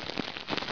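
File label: vine climb; HUBIE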